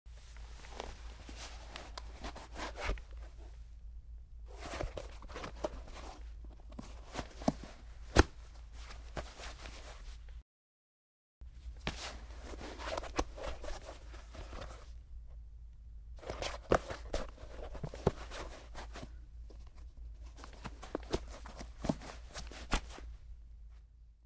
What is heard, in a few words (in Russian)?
nadevajut i snimajut obuv